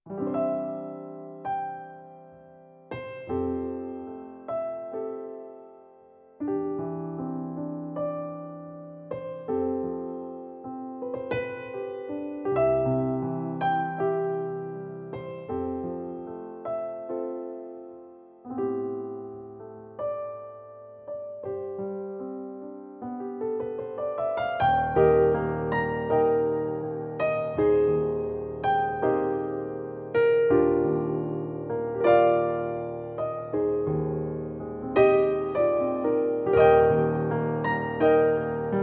dexibell vivo h1 vivo grand; dexibell vivo h1 test

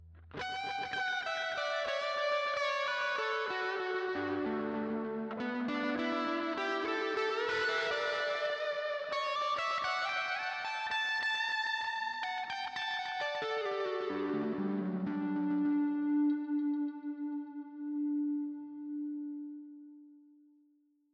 Magneto Lead even heads spring

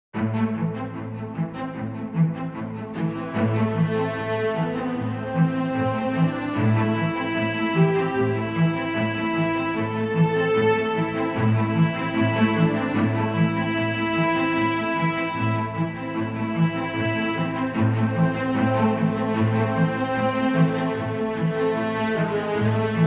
cinematic strings; cinematic strings 2

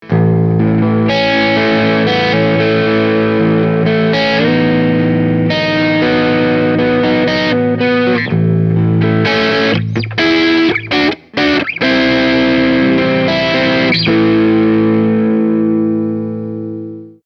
Soulmaster VSM-120 Distortion Neck; vgs; select sbm

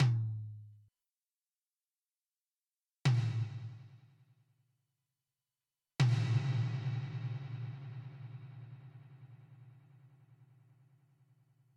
Casio XW-G1 FX reverb

